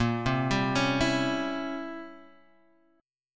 C 7th Flat 9th Bb, chord

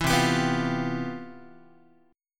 C Major 11th, Guitar, chord; strummed; CM11; 7,8,10,9,9,x

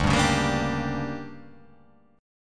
D Major 7th Suspended 4th Sharp 5th

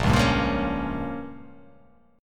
A sharp Minor 6th A sharp down, chord

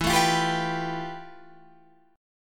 F Major Sharp 11th E

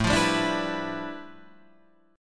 G 13th A, chord; G13/A strummed

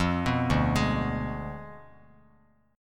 D Diminished 7th D down Bass Standard; arpeggiated

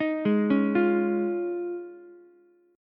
F Minor 7th Sharp 5th, Ukulele; JGuitar

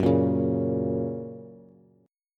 F sharp Major, Bass; strummed; x,9,8,6; JGuitar

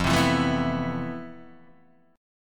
F Major Sharp 11th, Guitar, Open D; 3,3,2,3,2,2; down; chord shape

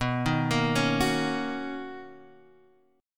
B Major 9th B, chord; BM9; arpeggiated; x,x,x,4,3,5,3,3

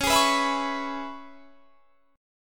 C sharp Minor 7th C sharp, chord; C#m7 strummed

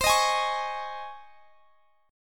C sharp Major 7th Suspended 2nd C

